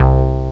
Synth Bass (C1); X-Wing; X-Wing Patches

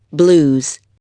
blues

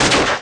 machine gun fire; Red Shark

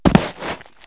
body dirt, crime; KABOOM! - Wild and Wacky Sound Software; citylife

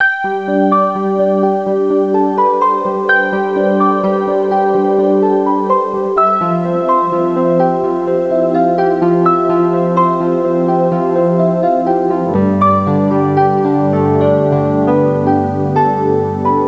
ROMANCE

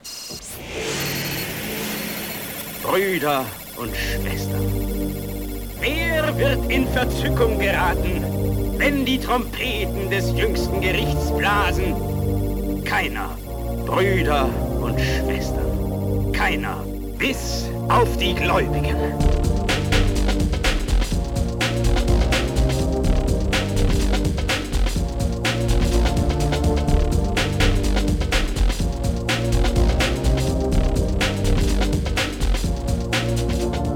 DEATH

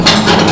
safe lock

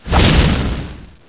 staff swing; Heretic II demo; weapons

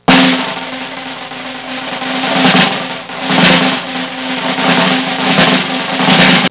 circus drum roll; Sound Effects for Multimedia - Volume 1

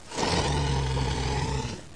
SNARL; PC Gamer IT CD 31; DINK

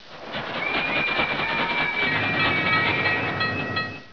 Train; Imaginemos2; MARS

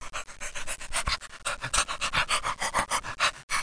pant; Spelling Made Easy

